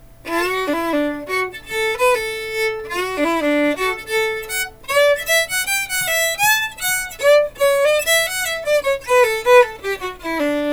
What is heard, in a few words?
country waltz